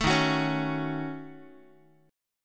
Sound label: D sharp Minor 7th Flat 5th D sharp down Guitar; strummed; JGuitar